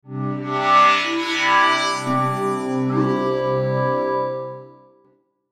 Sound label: Logo Warm Bright Synth Pad; Blastwave; Sonic Logos